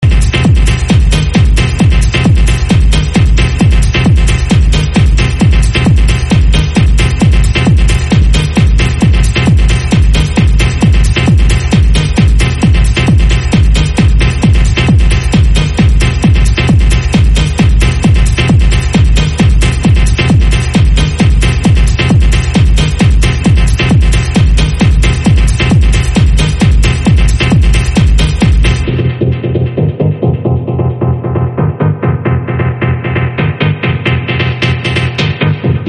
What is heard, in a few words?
rave